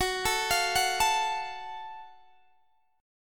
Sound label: F sharp Minor Major 7th F sharp Guitar DADGAD